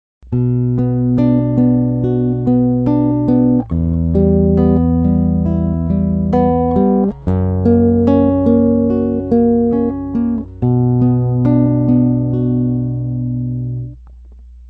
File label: arpeg bm, guitar